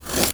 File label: Rubbing Scratching Mur Lisse